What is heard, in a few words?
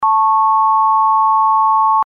interval, eartraining